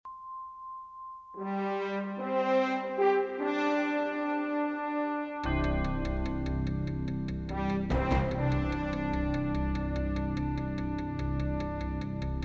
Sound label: Hard Promo Bed